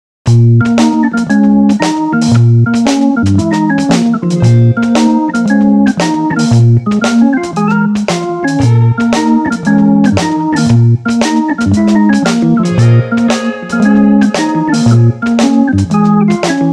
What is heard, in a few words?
Jazzy